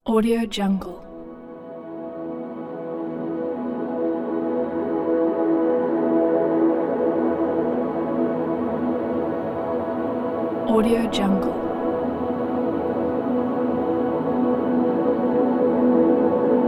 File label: peaceful soundscape atmosphere ambient